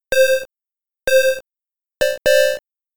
Countdown; Metro Cross arcade

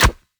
Queen Attack Hit; We Are the Dwarves

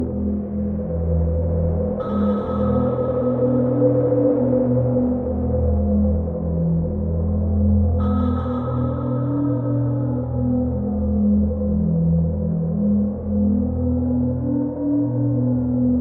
boss talk; Dawn's Light 2 gamerip

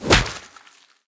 Tentacles Loopstart; The thing achievement

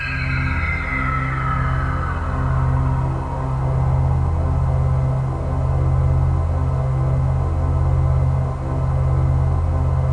Pad; Great Eagle 2

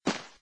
Dirt Footstep; gamerip; I Am Weapon: Revival

